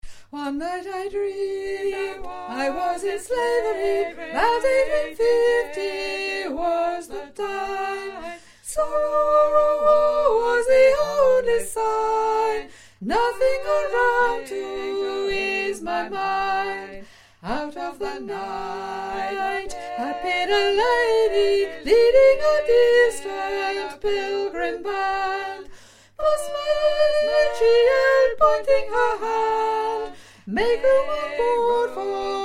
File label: harriet tubman mix sop